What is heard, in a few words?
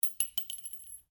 Cartridge 22 Caliber Long Impact Ceramic Tiles Stereo; shell casings of different calibers impacting on different surfaces; SoundEffects Foundation2